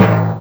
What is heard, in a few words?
Toms; Mutekki Media; Vengeance Electroshock Vol.2; VES2 Percussion